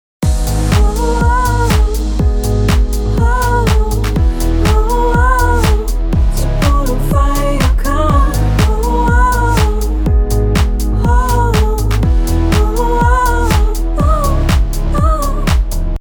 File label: pop